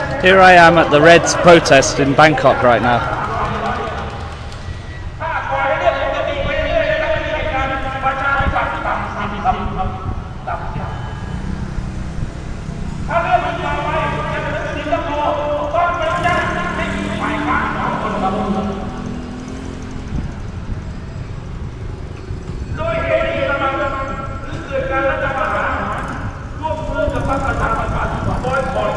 red shirts protest in bangkok

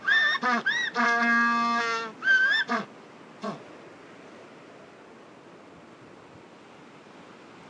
the voice of an african penguin

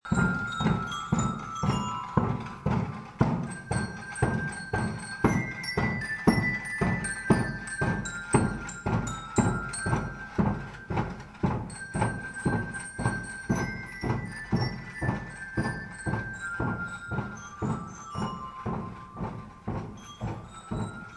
marching band outside my house

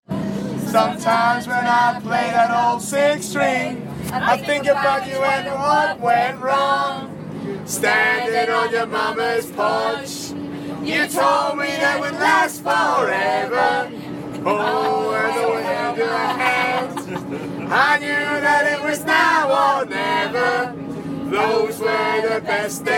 silent karaoke

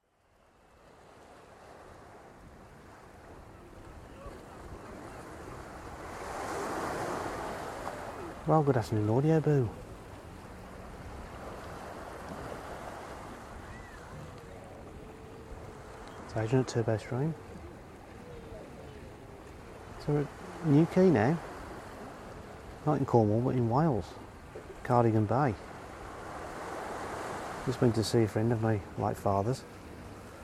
soundscape, west wales; new quay

